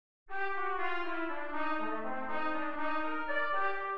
Min7 en C

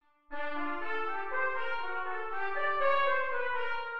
Min7 en C

